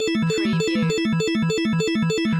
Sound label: Casino Slot Machine Organ Loop